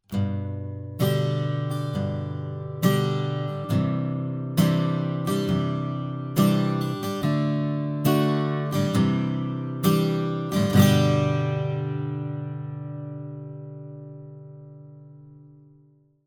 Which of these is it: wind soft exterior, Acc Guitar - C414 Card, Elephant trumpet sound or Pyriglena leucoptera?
Acc Guitar - C414 Card